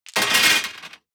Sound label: DICE on Metal, Throw and Roll, Standard, 16 Sixteen Dice; Samples; ArticulatedSounds; DICE Sfx Party (Extended)